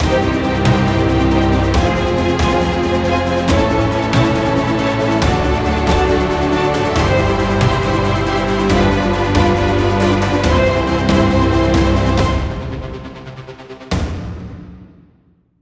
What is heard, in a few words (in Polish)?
Melodia Podsumowania Win